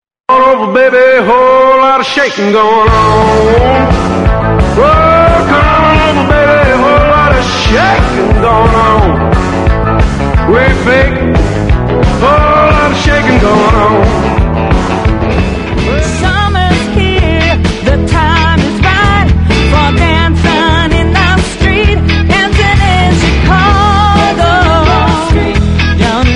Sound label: classic Rock